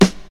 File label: Strong Bottom End Steel Snare Drum Sound F# Key